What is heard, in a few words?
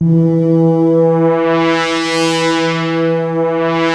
FILT SWEEP PAD; POP STR